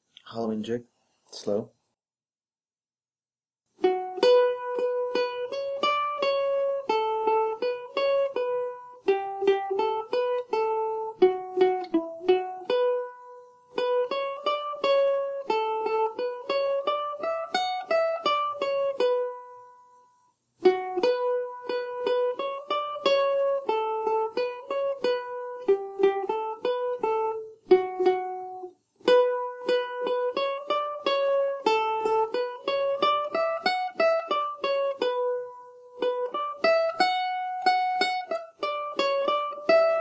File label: Halloween Jig slow